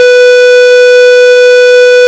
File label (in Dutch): snaar 2b; tonen; The A- tune is a standard for instruments and orchestres in the most